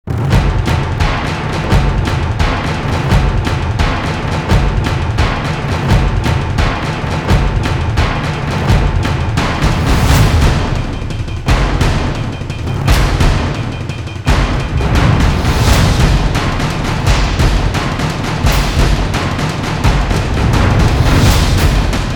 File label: drums fx